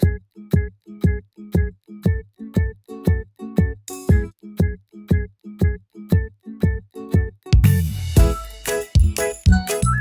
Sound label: dopamine ukulele